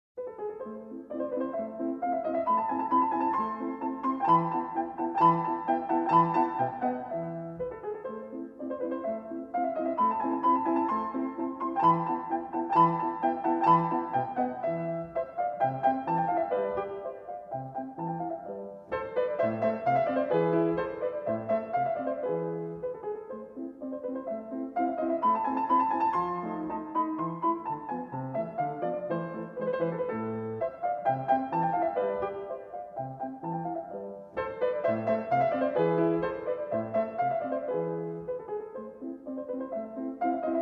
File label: Piano Sonata No. 11 in A Major, Turkish March, Rondo Alla turca (Allegr; K. 331; Mozart, Wolfgang Amadeus